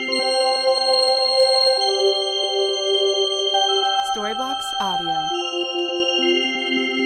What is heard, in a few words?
Ambient House Melody 136BPM C